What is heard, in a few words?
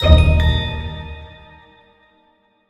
small Win Tonal Eb; fairymirror